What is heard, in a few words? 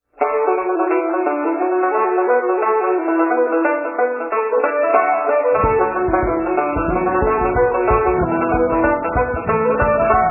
lofi; Jackie Coleman's / Green Gates / Glass of Beer (Reels)